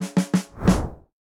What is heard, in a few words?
Enemy Turn All